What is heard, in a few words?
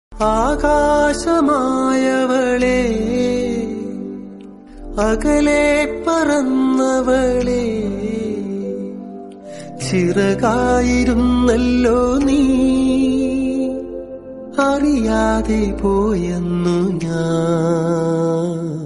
malayalam movie ringtone malayalam ringtone love ringtone; vellam